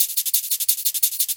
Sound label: D&B Percussion Loop; neuro